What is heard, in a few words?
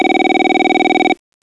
ring pattern